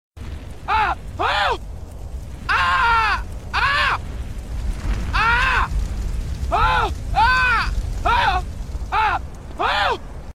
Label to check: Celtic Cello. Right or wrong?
Wrong